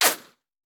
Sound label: Bullet Ricochet; Sound Ideas; Guns